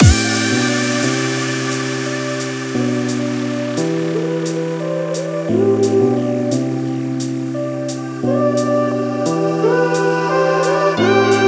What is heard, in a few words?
Surge (Drum & Bass Mix)